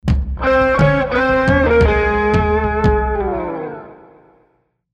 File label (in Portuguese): logo gt com ritmo; cbmm